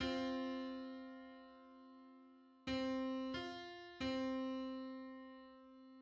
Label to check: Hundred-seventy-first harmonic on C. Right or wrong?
Right